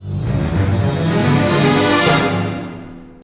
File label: Stinger; Ben 10 Protector of Earth; Wii